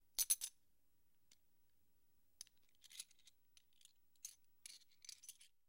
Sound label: Coins Jingle Light Co